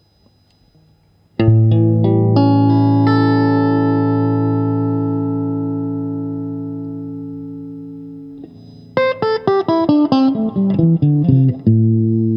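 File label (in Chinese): 60S MWS 美产铜线 清音 3档, acoustic guitar; kindman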